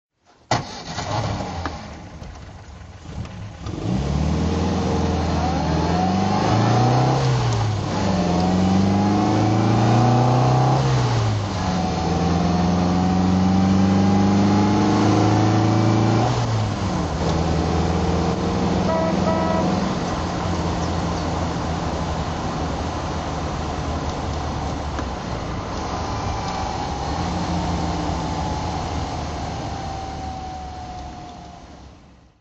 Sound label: mga 1500 roadster drive